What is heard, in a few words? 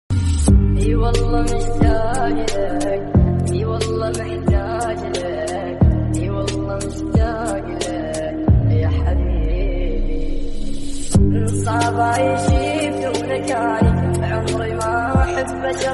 arabic belly dance instrumental